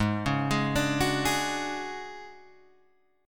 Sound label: D Major 7th Flat 5th G sharp, chord